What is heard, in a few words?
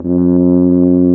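french horn, brass; Roland - LA Composer vol. 1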